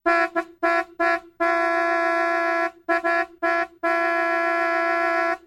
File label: Car Horn Med Honk Move, american